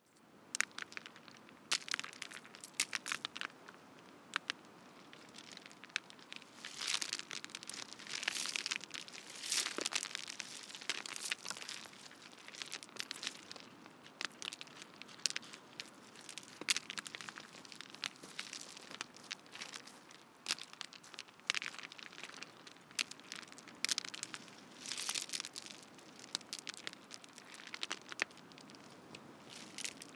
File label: crackling stones waterfall in background